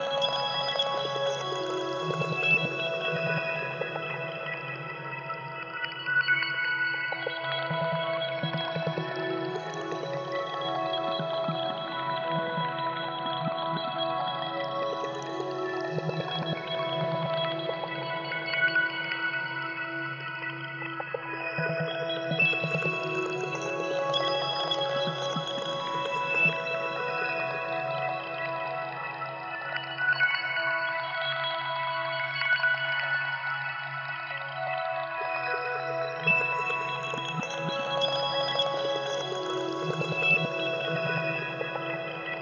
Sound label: XMAS Grains; Digital Rain Lab; Dreamscapes vol.1